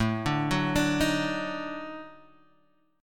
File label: D sharp Minor Major 7th Flat 5th, Guitar